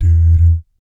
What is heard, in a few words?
BS D-D, Vocal Jazz FX; Spectrasonics; Vocal Planet; JAZZCOMBO